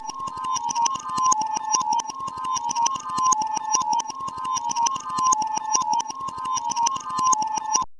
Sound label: revdance